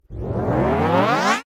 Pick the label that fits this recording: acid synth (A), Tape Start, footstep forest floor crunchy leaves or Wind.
Tape Start